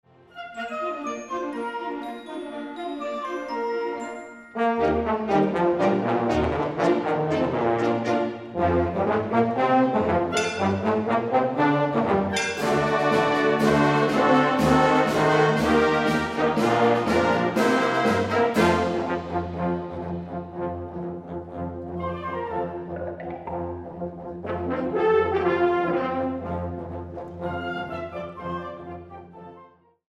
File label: wind track